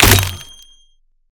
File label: weapon; Coffin Cannon